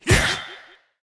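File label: ghost captain attack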